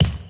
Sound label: gorefast step; kf2; gorefiend; slender